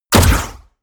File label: rifle assault rifle fire; comedy-cartoon; pistolen-gewehre